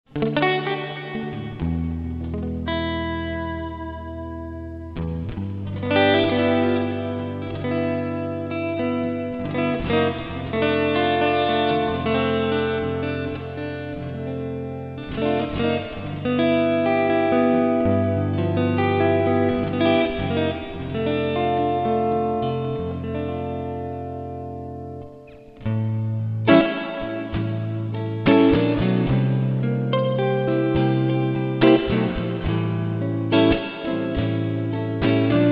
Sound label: dual reverb lab test